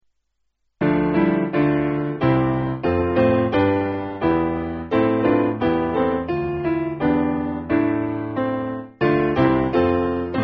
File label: When He Was Piano